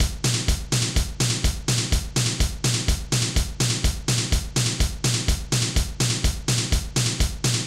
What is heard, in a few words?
beat